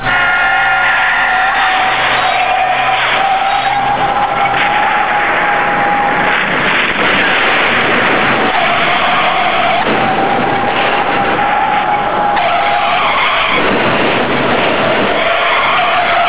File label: crashes